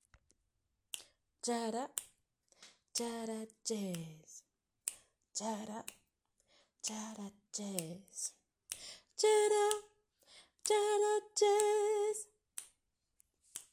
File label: tenor